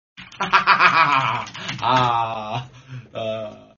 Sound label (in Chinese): 笑; solvable-sheep-game